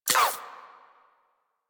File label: SMG Mech, weapons; Protea